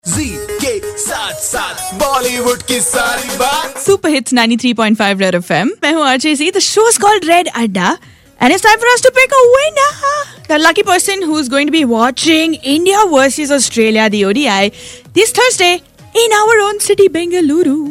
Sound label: chants for team India